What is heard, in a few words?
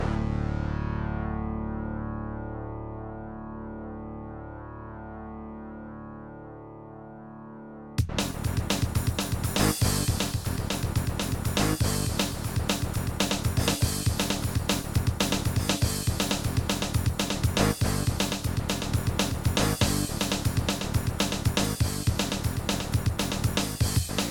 kawai gmega; soundcard test; Doom